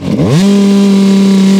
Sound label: throttle blip, YAMAHA